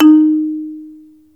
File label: MARIMBA C4